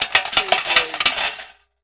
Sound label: Coin Slot